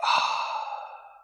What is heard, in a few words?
"ah"